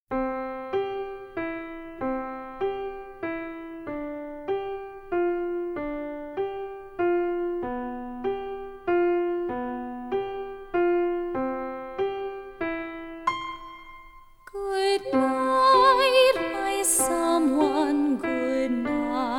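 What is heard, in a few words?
With Vocals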